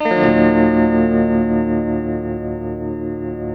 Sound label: TELTMUMIN E2, TELE CHORDS, Guitars; Spectrasonics; Hans Zimmer Guitars VOL-1